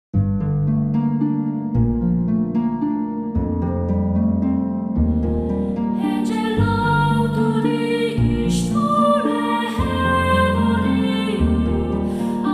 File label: BGM card field; Voice of Cards: The Isle Dragon Roars; Android